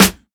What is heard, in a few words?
noisy snare g key, snare drum tuned to the G note. Loudest frequency: 3382Hz